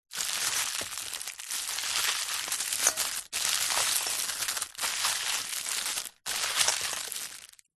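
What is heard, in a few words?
wrapped candy sweets boiled movement by hand in metal scales bowl; food; zapsplat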